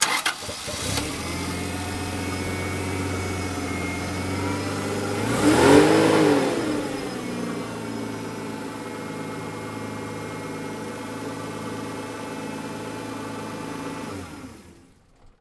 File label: Engine